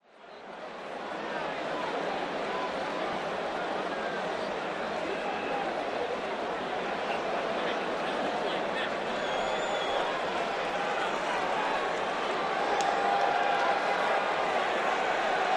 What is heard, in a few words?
Large crowd roars and cheers during a pro football game in an outdoor stadium.; Cheer, Crowd Crowds, Stadium Football, Crowd